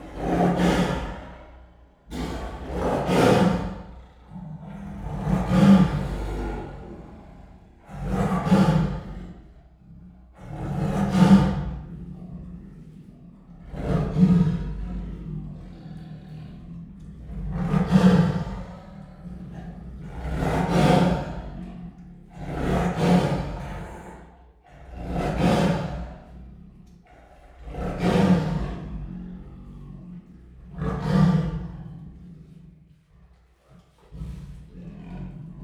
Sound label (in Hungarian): egyikőjük vehemensebb, szibériai tigris; állathangok; miskolci zoo 2018